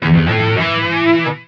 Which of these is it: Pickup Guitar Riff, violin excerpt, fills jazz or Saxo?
Pickup Guitar Riff